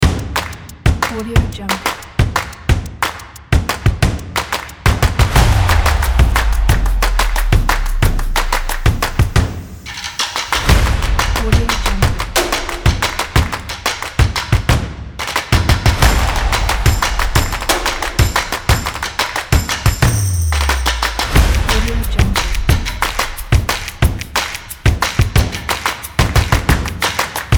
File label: a stomp kit